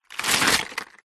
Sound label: foley leaf dry pick up from on top of others; zapsplat